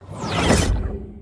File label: spell attack